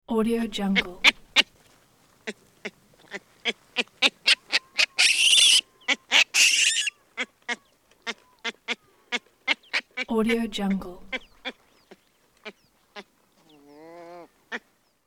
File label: baboon grunting and chirping; sound ideas